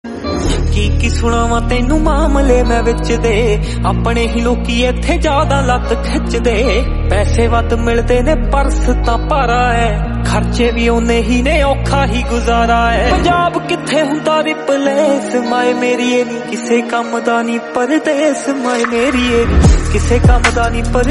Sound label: punjabi